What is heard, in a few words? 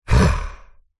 horror monster soft growl grunt curious breathy